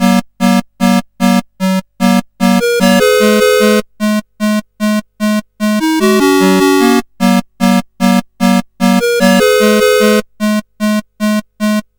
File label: Disco, CSG8580; CSG8580R5